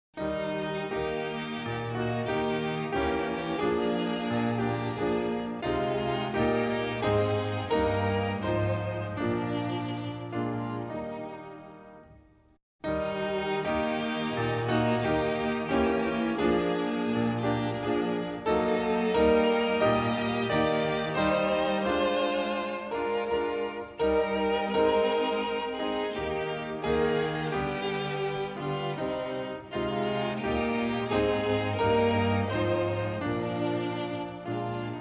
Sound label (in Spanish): musica solo